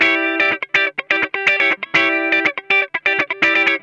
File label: E PEDAL, Funk Guitar; 90 s Sample CDs; Zero G